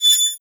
move, hinges drawers; Half Life Alyx; tap

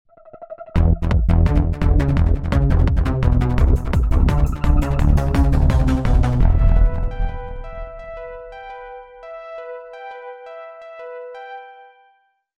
bomb ten sec count

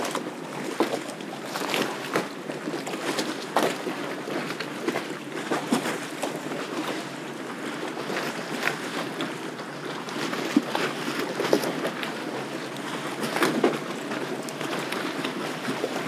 ambience canals water boats

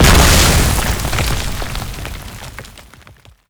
erupt, antlion dig; creatures

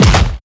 step; creatures; strider; seq passby

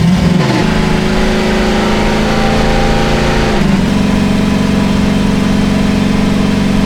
fourth cruise loop, v8, vehicles; Half Life Alyx

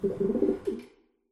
nature pigeons; Half Life Alyx; c17

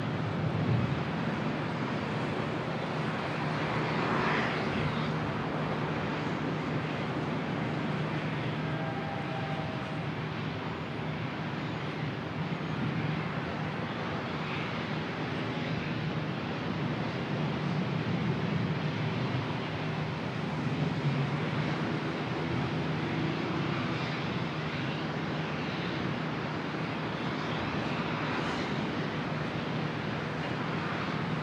exterior; Counter Strike Model Pack; CS:GO; nuke

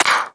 spew, infestation, bulb

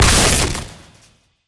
panel explode; scripted sequences

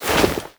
arm oneshot, foley

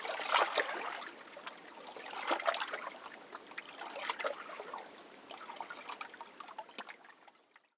ambience canals water bridge; Counter Strike Model Pack; CS:GO

